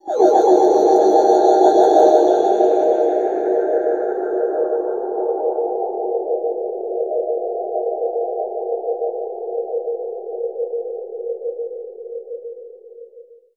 Atmo FX

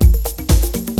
TECHNO BEAT, BREAKBEATS; Crypton - DrumBase5000

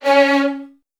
C#1, VN 16TH, Virtuoso Strings; ILIO; Kirk Hunter

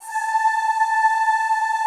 ZZZ A4, Classical Choir; Best Service; Extended Classical Choir

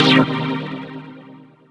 Stab C3; 90 sSampleCDs; Club Techno

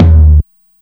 DPM48 LT, Sakata DPM 48

